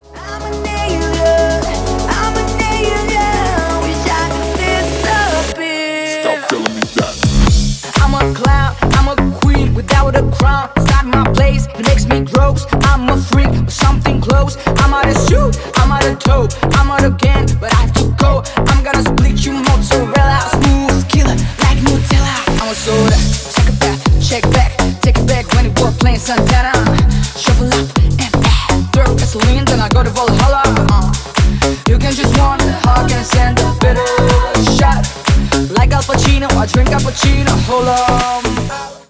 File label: funk remix